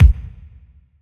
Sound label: long room reverb kick single hit d key